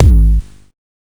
soft hitfinish

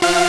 synth; osu

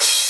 Cymbal short

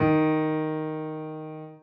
D#4 Eb4, Piano

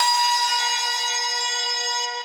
synth main

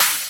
special hit

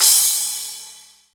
soft hit finish; osu

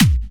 speed kick

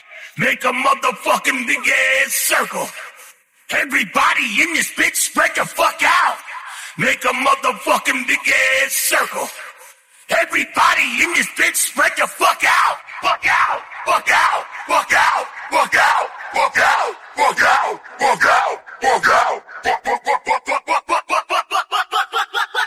acapella; osu

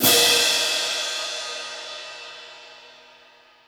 normal hit finish

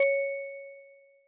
C#6 Db6, Music Box; osu

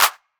Clapping

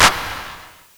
drum hit finish

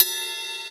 Ride Med

